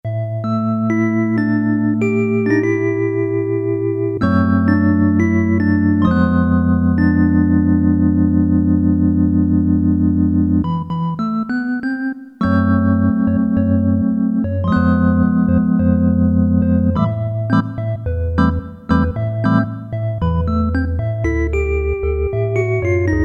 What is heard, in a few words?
BELL ORGAN, Roland Jupiter-8; Factory patches